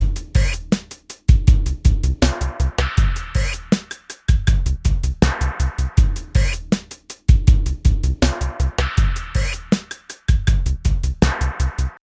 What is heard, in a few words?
drums